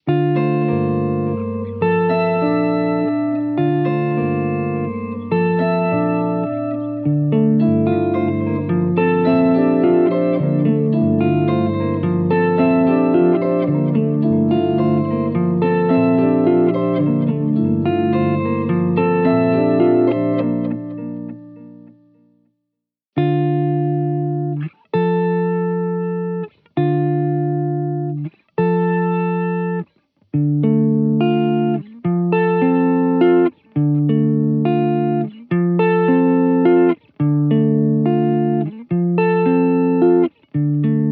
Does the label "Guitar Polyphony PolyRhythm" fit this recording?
Yes